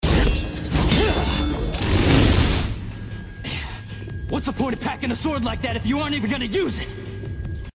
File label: Msg Tone